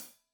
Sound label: Hat Loose, Hi-Hat, Cymbals Stereo